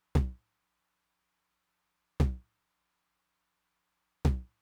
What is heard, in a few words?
Low Tom, Roland TR-909; Roland TR-909 Ableton Project